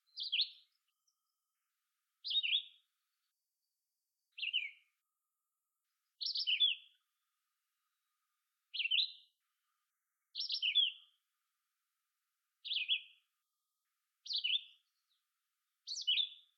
VIYR avion fin VIYR seul, vireo aux yeux rouges

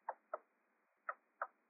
Grenouille des bois; sons pas oiseaux

